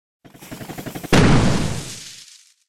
Firecracker Confetti; 烟花炮手

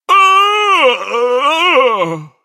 die

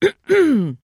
trader clear throat; 商人杰恩（七日杀）

